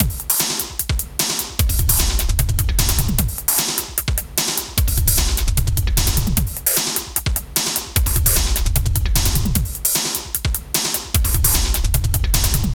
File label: HEAVY, 150; Masterbits - Tekkno Trax; D LOOPS